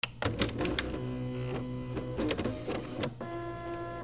OFFICE

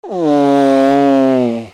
CARTOON

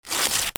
PAPER

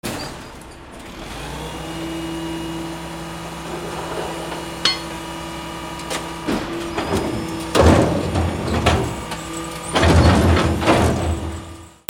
VEHICLES

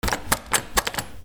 DOORS

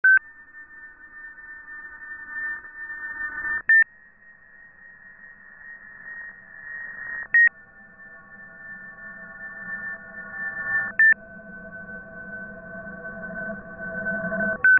SCIFI

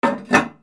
BATHROOM

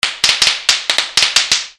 BREAKING

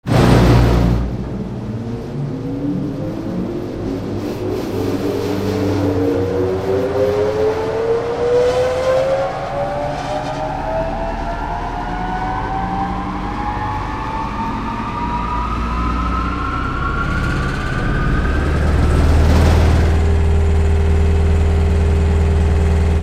INDUSTRY

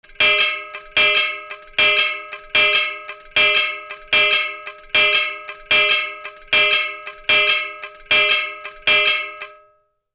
BANGS

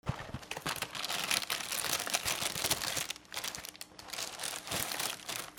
KITCHEN

